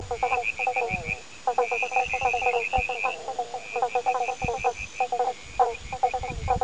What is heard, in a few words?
Growling Grass frog; duck dinner pond